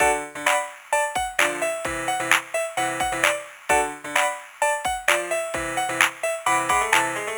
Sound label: Small Stir and mix; Scratchin' Melodii; Sage 2022 Demo